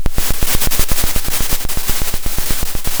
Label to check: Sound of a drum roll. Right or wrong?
Right